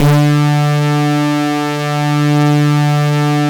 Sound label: LEAD BRS D3; Drums In Your FACE!; LEADBRASS